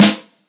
Snare; GentleHour

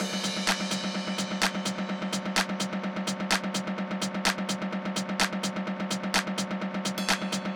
beat break

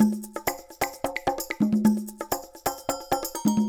Loop Percussion 130

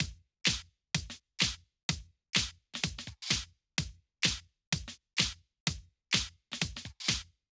fill kick

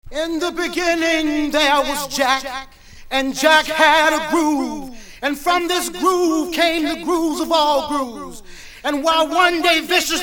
Intro House Music accapela

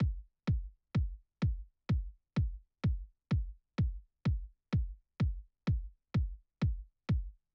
kick

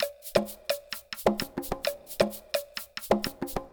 Loop Percussion

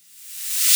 REV NOISE CRASH; drums pads; noises

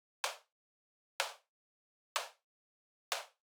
snare

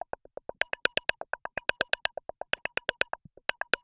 percussive tinies; drums pads

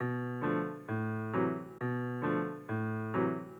piano

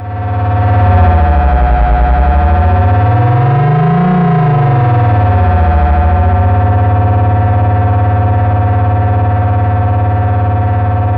NOISE FX

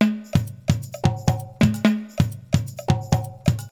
Loop Percussion 130

